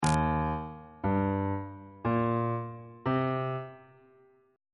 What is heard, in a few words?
e flat major 6th chord intervals on bass clef